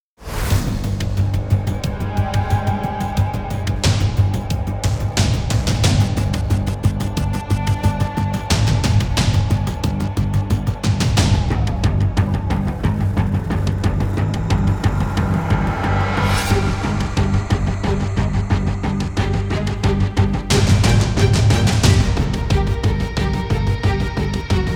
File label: Hybrid Orchestral; Atomica